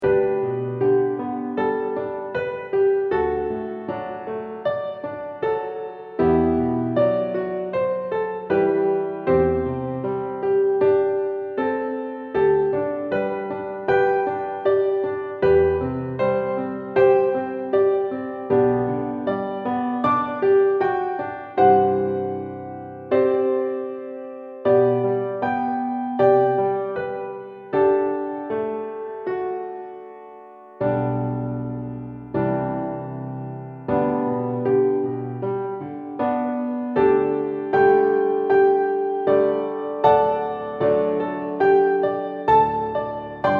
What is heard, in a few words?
Accompaniment